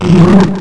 gurp; quake2; fbetch

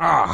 fall; quake2; strongbad

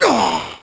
pain; quake2; ranger

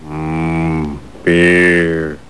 drown; quake2; homer1 4

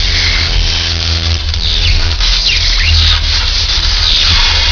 okami zap; quake2; kitsune

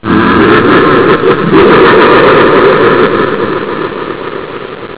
drown; quake2; andross

